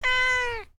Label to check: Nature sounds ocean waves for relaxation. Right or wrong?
Wrong